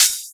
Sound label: Open Hihat, Cymbals; Vengeance Samples